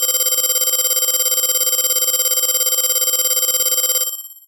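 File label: Alarm Call DX100 G#2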